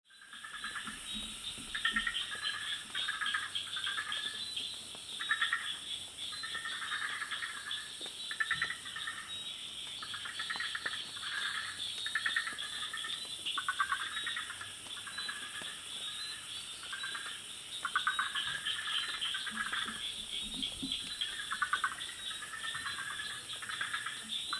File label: Jungle Sounds